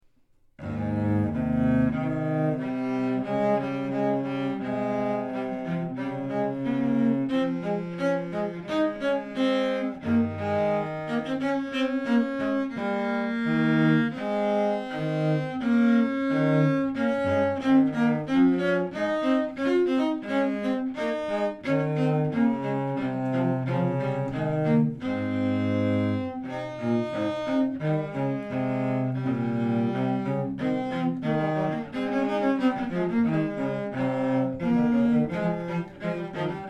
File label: Etude for 2 Cellos